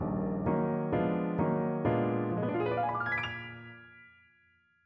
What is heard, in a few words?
piano